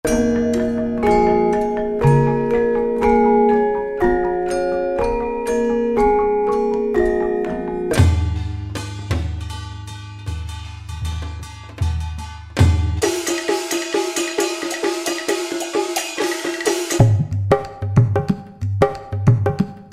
Asia Phrase, Roland SR-JV80-14 World Collection Asia